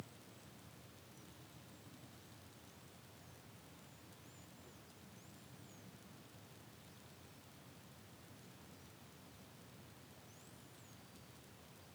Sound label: Whistles; HP100 amplify